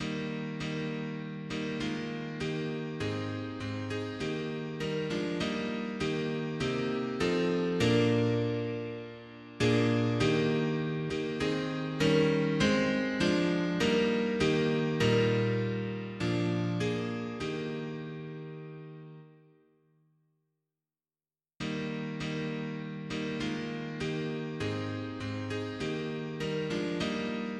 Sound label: mono piano; hymns; Himnario grande